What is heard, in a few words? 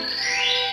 stored powerup; superbroswar